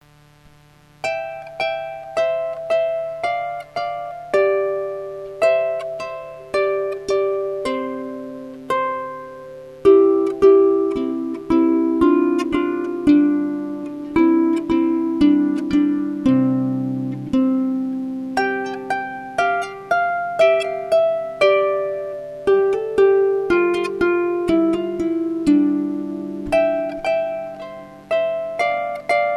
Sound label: Sound the Trumpets